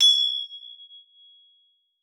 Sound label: AMBO; Xsample VOL-9